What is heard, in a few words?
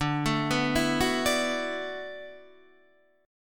D 6th Add 9th, Guitar, DADGAD